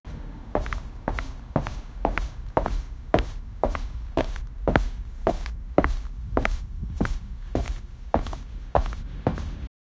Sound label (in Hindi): thela chalne ki aabaj, wheels